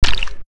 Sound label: splash, footsteps